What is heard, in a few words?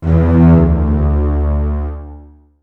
synth